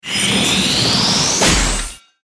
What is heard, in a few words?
attack; hero sound